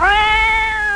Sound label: demon